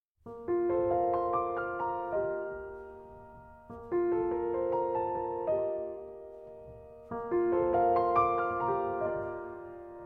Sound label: Solo Piano Version